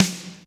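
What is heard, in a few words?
processed snare, drums; Crypton - DrumBase5000; PROC.DRUMS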